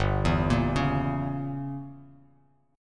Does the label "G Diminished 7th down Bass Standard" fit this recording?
Yes